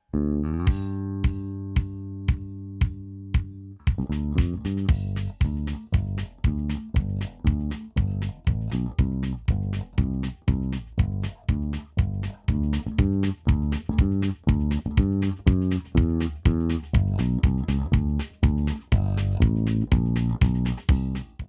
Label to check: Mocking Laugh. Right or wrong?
Wrong